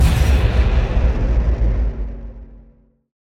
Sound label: rocket; spacemap; rockets